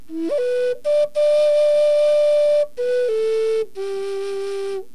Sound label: Ripple Vibrato Staccato; Dvorak